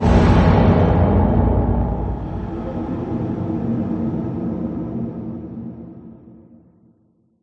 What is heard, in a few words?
gameover mono; Shadows of the Damned